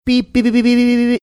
making series beeping sounds

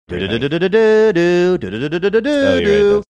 singing level clear song; super mario bros

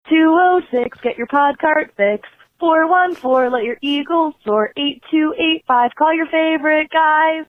jingle for voicemail number; tbtl

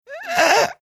making weird squeaky sound

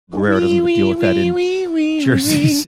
singing portion

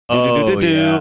mimicking jingle; tmobile

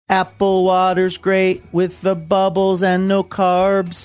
singing apple waters great with bubbles

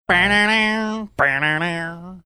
singing short portion of song

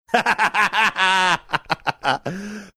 laughing

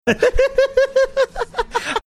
cute laugh

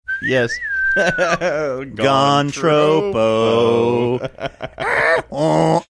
whistling saying gone troppo donkey braying sound

